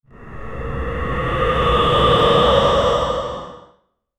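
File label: short sound for game design, appearance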